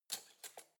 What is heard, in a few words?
cutlery spoon drawer place soft